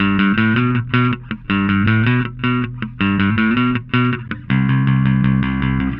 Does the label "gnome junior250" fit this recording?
No